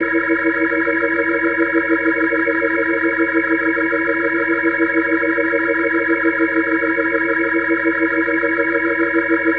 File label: Organ F#7, Hip-Hop Organ Licks